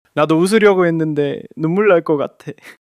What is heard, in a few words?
crying